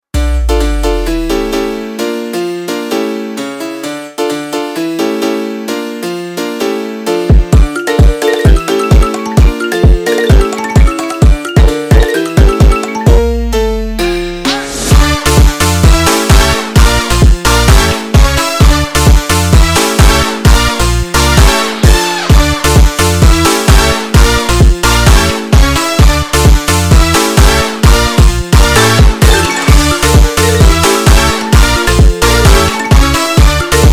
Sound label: Instrumental